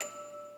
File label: musicbox